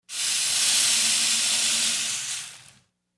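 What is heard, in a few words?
Detergent Powder Pour, kitchens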